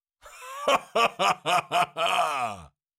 Cartoon lion voice laugh laughter

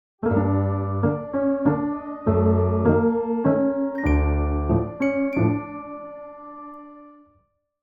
Video game success victory win spooky

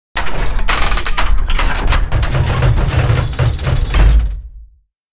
Big cinematic gears movements

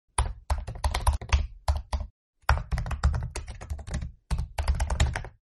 MacBook keyboard typing

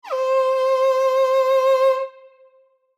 Violin slide up

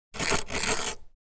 Ice scraping frozen ice block

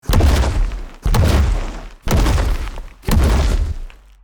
T Rex dinosaur movement medium

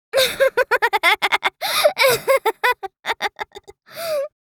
Young girl funny laughs; gfxsounds

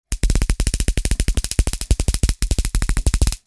Bug zapper rapid electrical zaps; insect

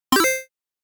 Casino slot machine scatter symbol

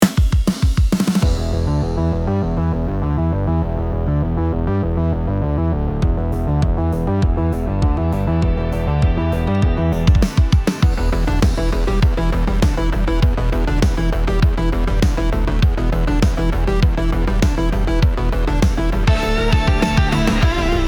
Sport powerful unleash the grind

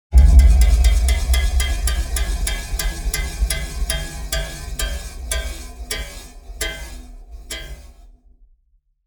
Rusty table fan spinning; appliances

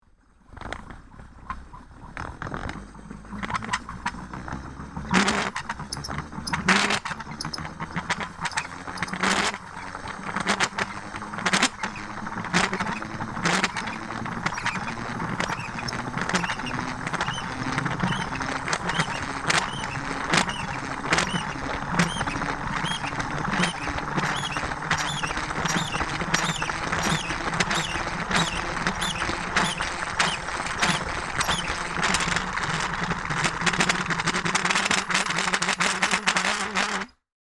Knife sharpener rotating; hand tool

